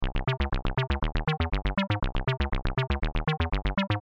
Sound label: synth